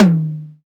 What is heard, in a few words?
acoustic tom drum d sharp key, Royality free tom one shot tuned to the D# note. Loudest frequency: 507Hz; tom drum samples